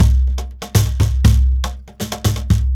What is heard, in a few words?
DRM